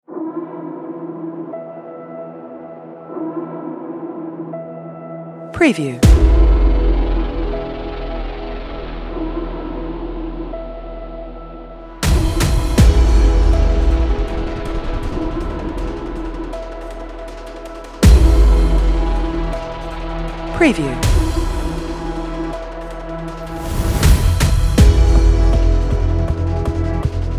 Cinematic Hybrid Apocalypse Dark Action Trailer Music